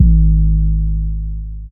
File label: overdrive, Kicks